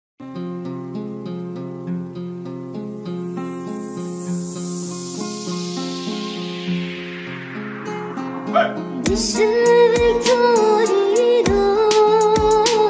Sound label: kurdish trap remix